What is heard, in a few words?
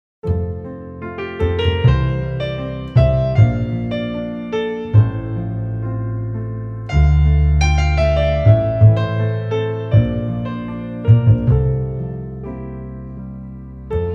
Bluthner + Strings; Pianoteq 6